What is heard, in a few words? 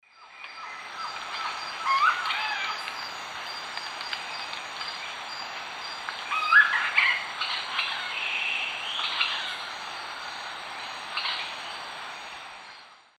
Celeus grammicus, call and chatter; S. America; Eastern Lowlands